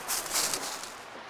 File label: Shaking Tree